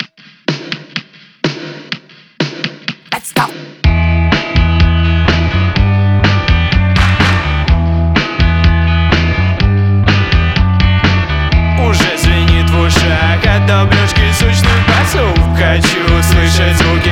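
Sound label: String sounds; Zvuki strun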